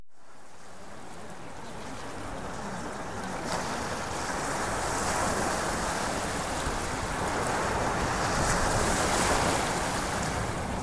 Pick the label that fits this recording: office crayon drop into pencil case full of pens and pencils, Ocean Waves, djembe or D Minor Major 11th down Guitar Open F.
Ocean Waves